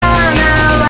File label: Crow Song